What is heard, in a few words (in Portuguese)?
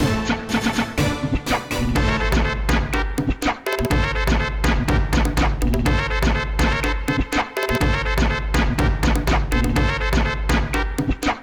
vinheta traficantes; Favela Wars